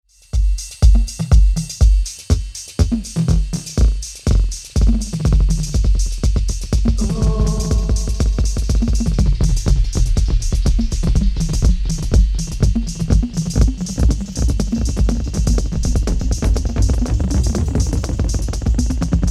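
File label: Full Feedback Time Dry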